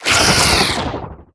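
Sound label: Teleporter Stealth Attack; Evolve; Wraith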